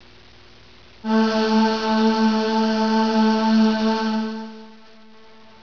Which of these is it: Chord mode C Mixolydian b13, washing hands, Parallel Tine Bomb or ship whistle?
ship whistle